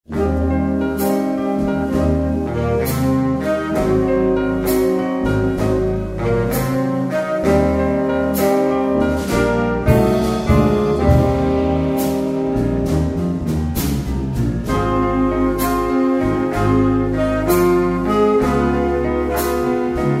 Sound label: Blues